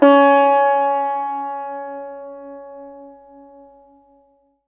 SITAR B; Spectrasonics; Heart of Asia

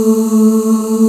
VOX A2; Vox Percplex'd

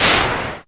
NOISE FX; Back In Time Records; Big Bang; SFX

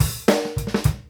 FILL; PlatinumPhattCD1; E-MU Producer Series Vol. 8 – Platinum Phatt; Grooves Filz Hitz

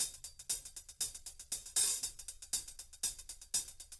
120 hh; Big Fish Audio - DOM

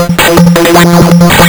lsdj waves; wavetables

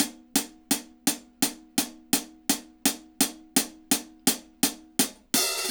HAT, HI HAT LOOPS, Dub; Drumdrops In Dub VOL-1

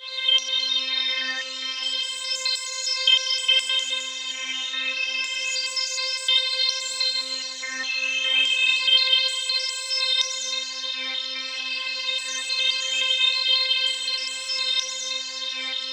C4, Roland JV 2080 TECHNO PAD

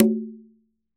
BRSH, Congas

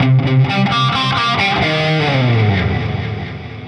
130bpm, 80s heat samples; musicradar

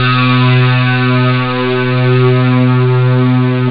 sweep; jazz jackrabbit 1; M8

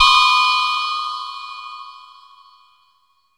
SYN FX, FX PERCUSSION; AKAI S6000 CD-ROM - Volume 5

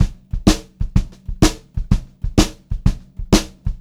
126 CL VARI L, Pop-Rock Drumloops; USB Soundscan vol.37; AKAI; 90 s Sample CDs

